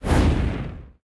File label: projectile; smite; raijin